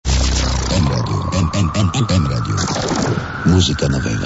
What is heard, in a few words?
jingle, radio; m radio